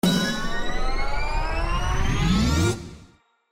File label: ANTICIPATION BONUS FS; Zodiac Millionaire